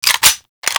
Clip In; Dual Vipers; Weapons

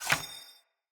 Bow Charged Shot Ready; Weapons; Josalfar Bow

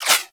Laser Whizzby; Warframe; Bullet Impacts; Whizzbys

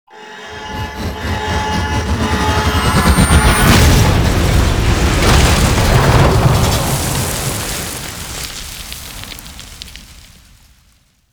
Dropship Control Loss; Gameplay; Warframe; Veilbreaker; Devourer Kahl

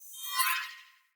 Sword Whoosh Accent Heavy; Fey Sword

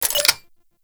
Pistol Clip Empty Mech B; Tenno; Bard

